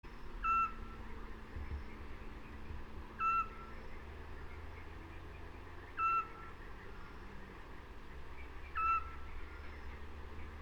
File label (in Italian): assiolo canto